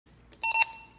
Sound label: walki talkie notification